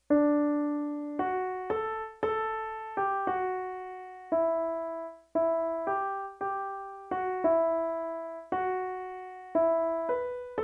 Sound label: Olivet MELODY